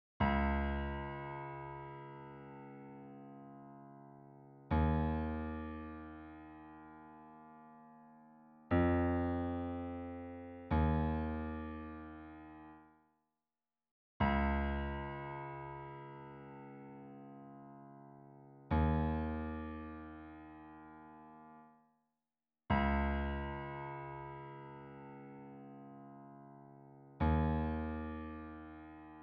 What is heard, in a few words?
Steinway Grand Piano